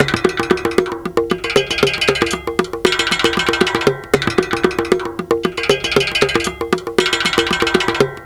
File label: drm 116, Breakbeat, 116bpm; Rodent Power